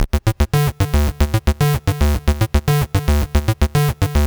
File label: 112 D 303 thing, Melodic Loops; Electronisounds